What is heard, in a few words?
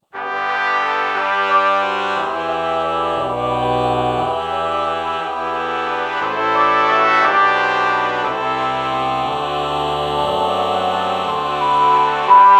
ah trumpet morph